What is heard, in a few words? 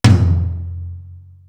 HALL TOM; East West; Global Sounds